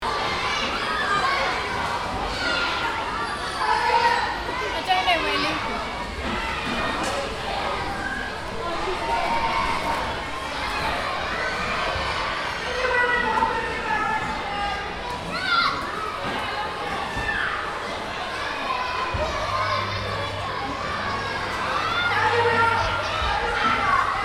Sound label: Childrens interior playground lively walla playing loop